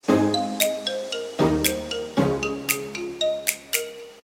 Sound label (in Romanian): clopotei ringtone; ton de apel